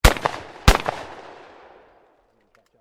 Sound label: Shotgun 12 Gauge Underover Firing 2 Shots; Sporting Shotguns; Zafer Pointer